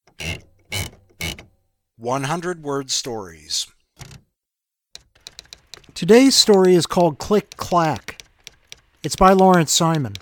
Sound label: click clack